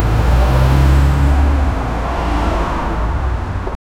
bustling city with very s

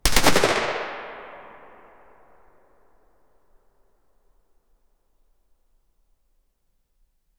single sp5a3 9mm gunshot

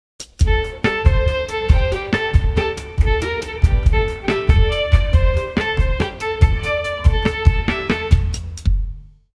soft instrumental; empowering

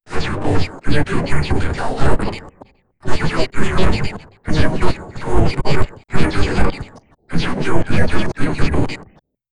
robots

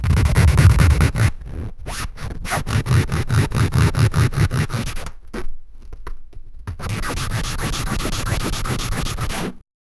scratching rubber with bl; blade

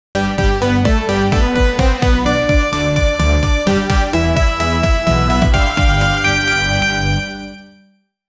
a brief 3 second techno, rave

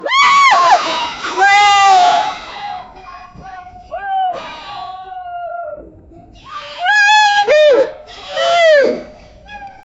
two women breath heavily; fight

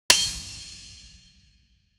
punch bone crash, hard hit

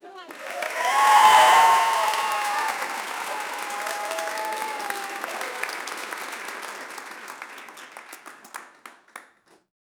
crowd applause; 25 seconds

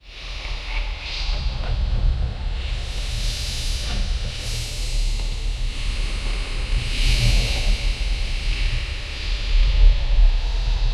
suspense terror dark ambi; beast

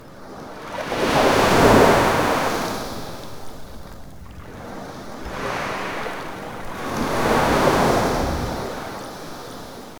calm beach waves gently c

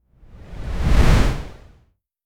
whoosh video transition

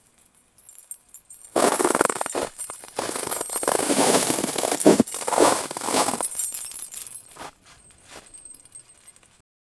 fast whoosh sleigh swoopi

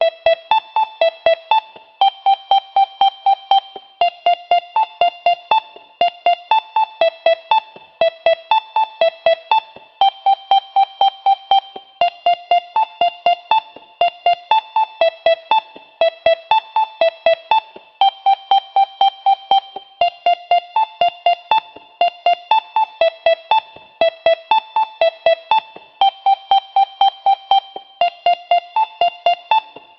bloop ringtone 120 bpm

